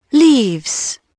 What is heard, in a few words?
leaves; Dorling Kindersley My First Incredible Amazing Dictionary